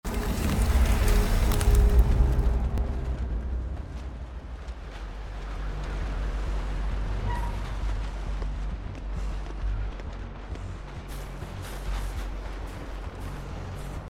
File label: environment; Dead by Daylight